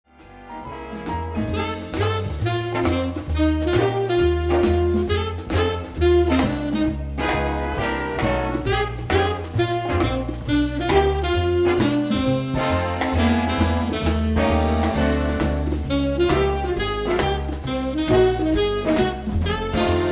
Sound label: Blues